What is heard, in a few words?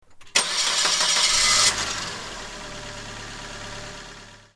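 engine start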